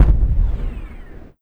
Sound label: small explosion